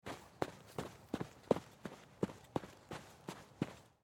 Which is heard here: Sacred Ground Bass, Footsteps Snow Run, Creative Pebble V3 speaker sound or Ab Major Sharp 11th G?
Footsteps Snow Run